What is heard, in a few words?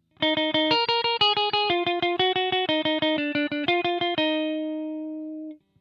alt pick